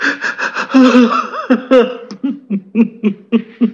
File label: Soldier Cry